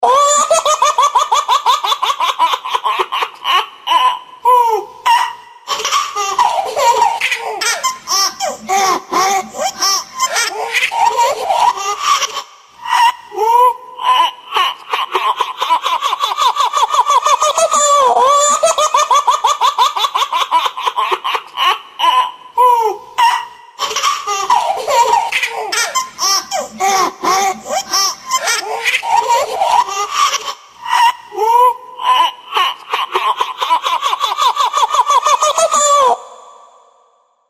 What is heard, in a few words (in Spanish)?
tono risas bebe